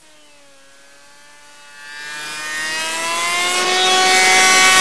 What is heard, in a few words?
manhack approach; hl2